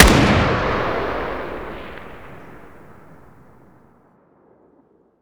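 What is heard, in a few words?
fire dist 762x39 rifle ext; gmod; arccw uc commons attachments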